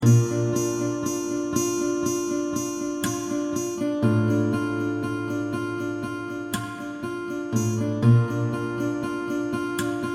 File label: Wind of Summer